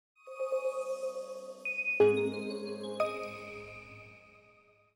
Power On; Samsung GT-S8500 Wave

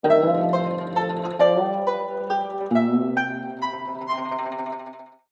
mile zither; ZTE Nubia Z17 Mini; alarms

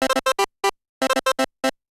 biptone (Insert Coin), ringtones; Google; NewWave Labs

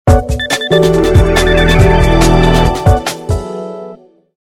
Electro

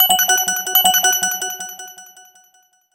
Vanilla, ringtones

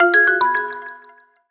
Bent Mallets; Vivo X3S-W

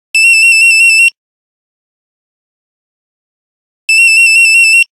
Nokia Buzzer Mosquito; Windows Phone apps

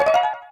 High Battery; Condor C1+

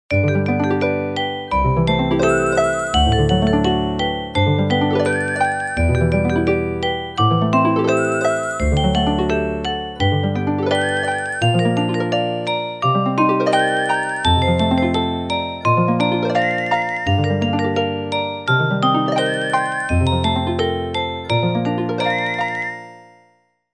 Flowers and Bees; Samsung SGH-T309; Ringtones

